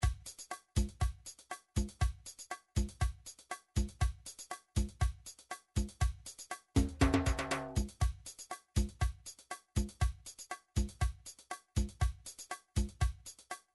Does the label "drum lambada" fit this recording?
Yes